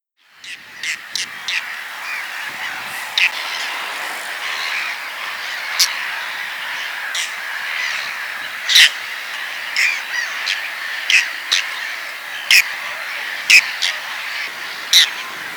witwangstern roep; Gerrit Kiekebos

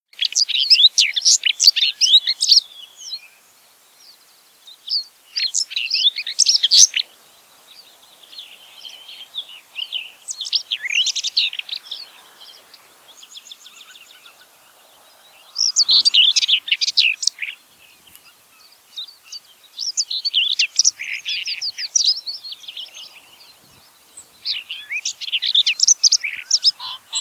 grasmus zang

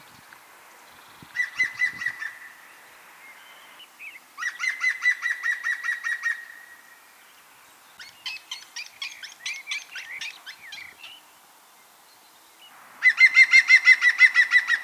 groene specht roep en bedelroep

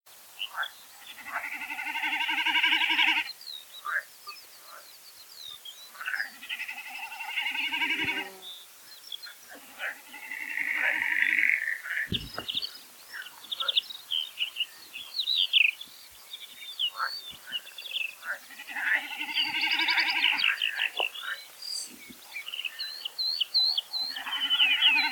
watersnip zang

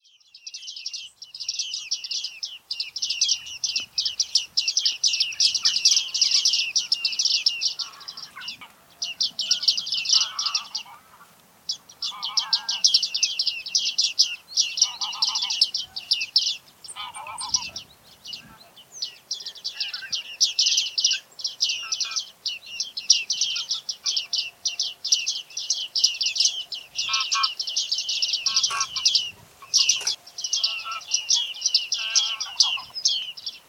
baardman roep

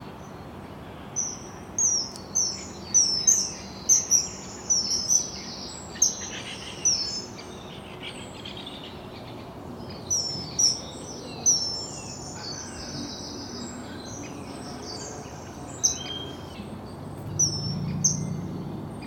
ijsvogel roep

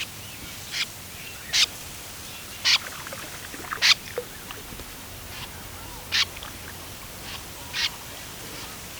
watersnip contactroep

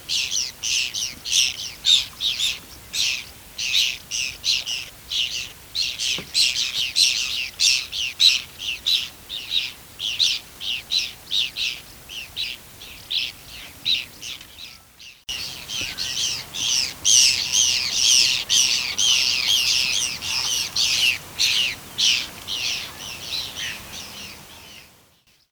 witwangstern bedelroep juveniel

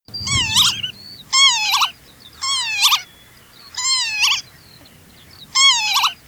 kievit roep

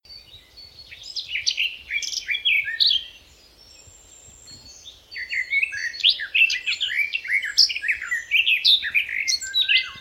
tuinfluiter zang

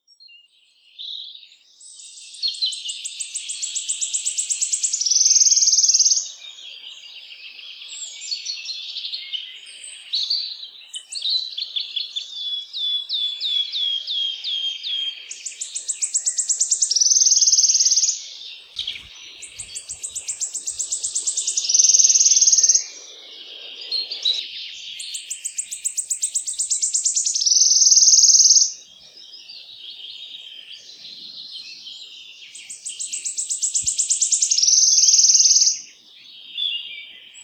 fluiter zang

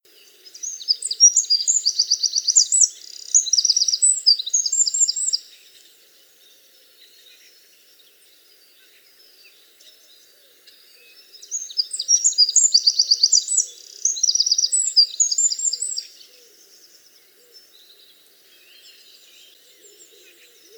winterkoning zang

